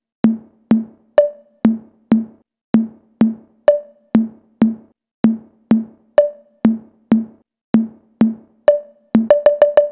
Synthetic Percussion; Sonic Foundry (Sony Creative Software); Club Joints and Street Anthems; Toxic Rain

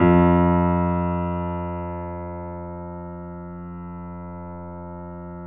F 1, GRAND P; USB Soundscan vol.17

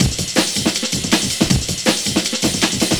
LOOP, Eurotech; Sounds Good

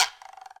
EQ Perc, Percussion Mix

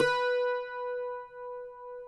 cuatro; Spectrasonics; Hans Zimmer Guitars VOL-2

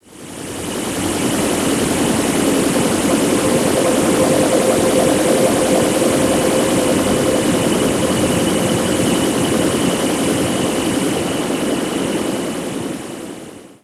AIR MELT, DEEP ATMOSPHERICS; Sonic Foundry (Sony Creative Software); Vir Unis Infusion

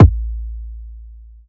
BD, Hip-Hop & R&B; USB Soundscan vol.47; AKAI

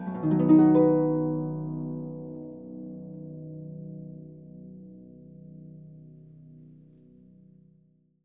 Percussion & Harp; Miroslav Vitous

